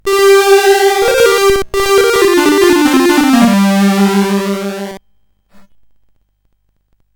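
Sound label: Operation Hormuz, MOS 8580 SID chip music, games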